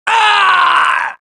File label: death, dialogue, Allies; mohaa; Mission 2